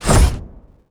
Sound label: leap up